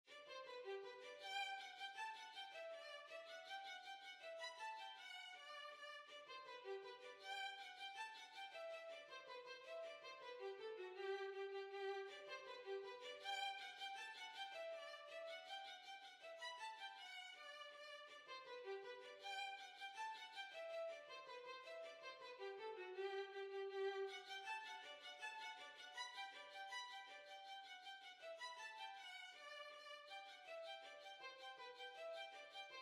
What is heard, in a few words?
Hornpipe, Violin